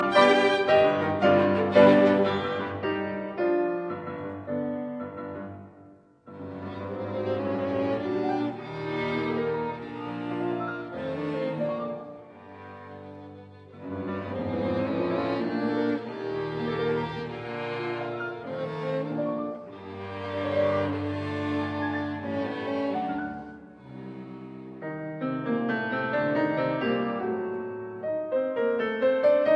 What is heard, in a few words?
Piano Trio in C minor Allegro molto; Op 101; Mirecourt Trio